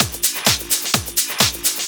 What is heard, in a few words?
Clubhouse Loop 128 BPM; Best Service; House Definition VOL-1